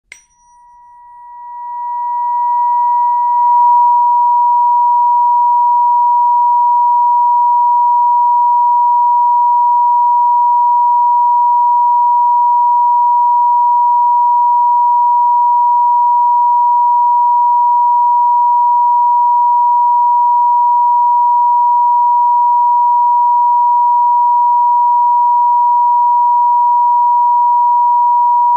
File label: 963 Hz frequency